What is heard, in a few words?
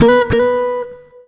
120 JAZZ, Electric & Acoustic Guitar Loops